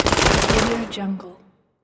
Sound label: machine gun firing burst